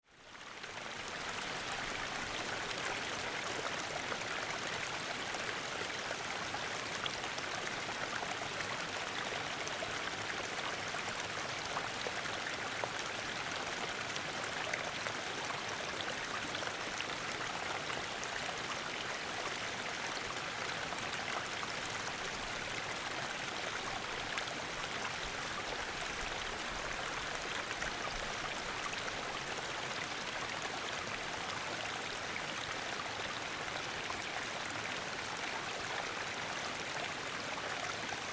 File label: stream and waterfall